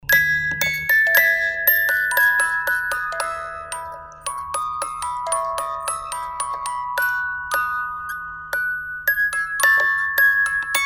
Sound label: Gavotte Bach, musicbox